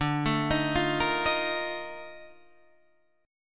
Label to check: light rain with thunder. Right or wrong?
Wrong